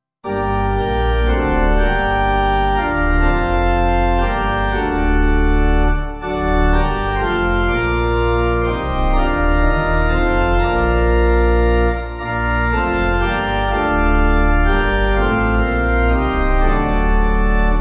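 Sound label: With Holy Joy, Pipe Organ; Mein Seel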